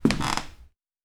Creaky Wood Floor

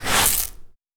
Sand gravel scrape

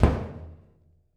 Metal

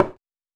High Heel

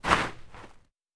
Snow

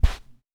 Carpet

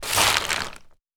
Leaves

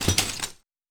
Glass

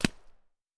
Mud

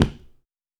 Dress shoe

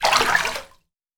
Shallow Water

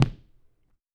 Sneaker

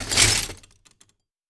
Glass scrape

